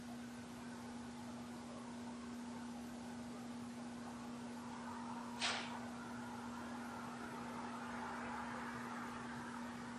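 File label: Ballroom Static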